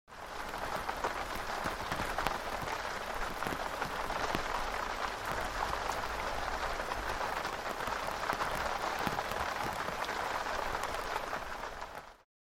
Interior of tent with rainfall outside

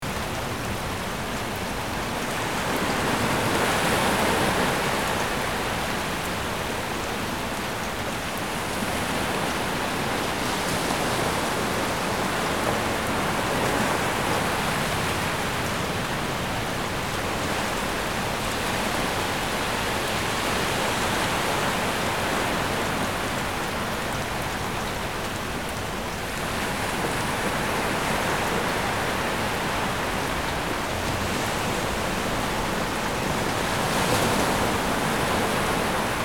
Strong sea wind and waves ambience; ocean

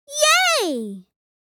Funny kid cheering; gfxsounds

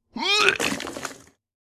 Person vomiting into a plastic bag

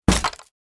Karate chop wood breaking

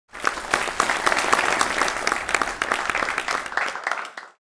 Crowd reaction people applause

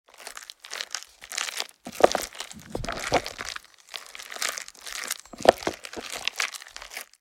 Stirring cake mixture cake batter

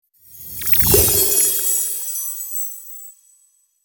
Magical cartoon pop

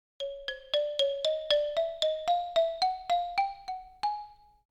Cartoon sneaky tiny walk

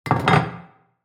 Plate placing down on table; household

